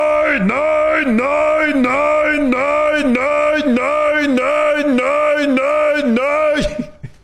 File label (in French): alarme incendie; talmo